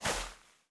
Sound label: Personnel Footsteps Sand; DCS